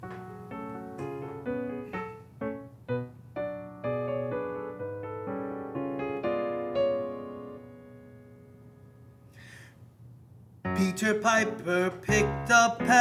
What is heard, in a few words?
Peter Piper 3pt Mixed Part 3 (Tenor)